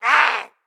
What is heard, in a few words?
attack, zombie; DayZ Epoch